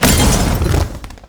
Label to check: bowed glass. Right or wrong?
Wrong